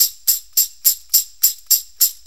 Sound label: Tamb, Perc Loops; Drum Loops; 4-4; Sonic Foundry; Drum Tools